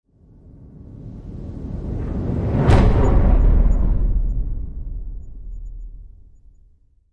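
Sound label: Hit Swoosh Planet Thunder; Brand X Music; Brand X Music Catalogue - Editor's Essentials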